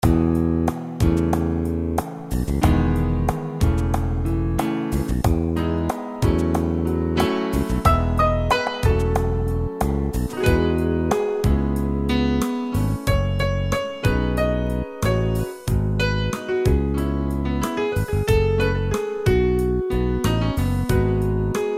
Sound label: Pianist